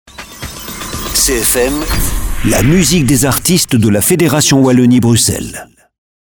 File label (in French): radio fête de la musique jingle; CFM RADIO